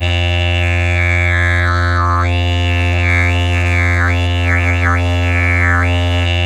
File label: F drone simple, Didgeridoo; Big Fish Audio; Didgeridoo and Other Primitive Instruments